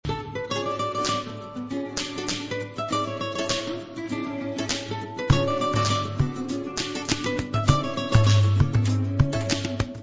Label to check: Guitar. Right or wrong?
Right